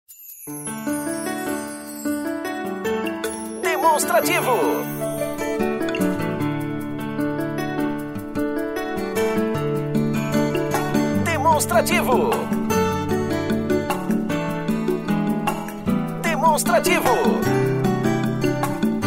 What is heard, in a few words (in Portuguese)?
tema espera